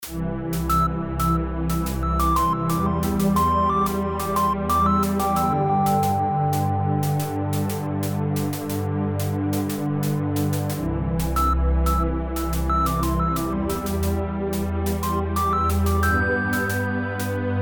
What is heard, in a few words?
Bells And Strings